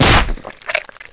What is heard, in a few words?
SPLAT